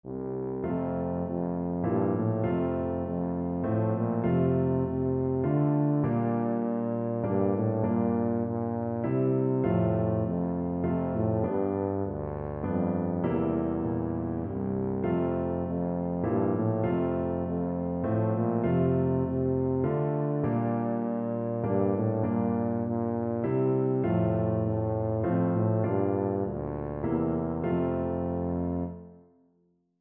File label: away in a manger, tuba